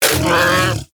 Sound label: Vocal Pain; Hunting Critters; Predasite